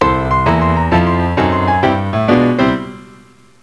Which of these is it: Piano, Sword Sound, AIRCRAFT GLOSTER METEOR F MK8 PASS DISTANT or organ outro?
Piano